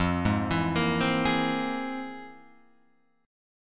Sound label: F Minor Major 11th, Guitar, Open F; arpeggiated; JGuitar